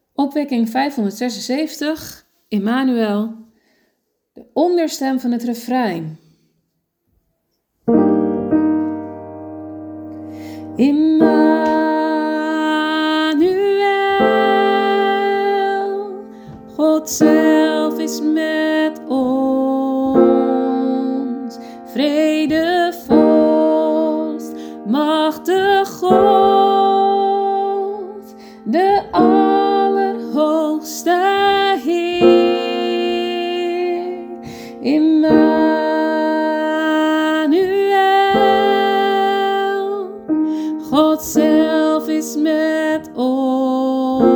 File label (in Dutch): lage mezzo alt; geluidsbestand onderstem refrein: lage mezzo’s en alten